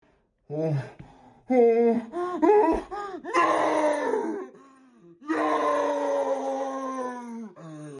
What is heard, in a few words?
piano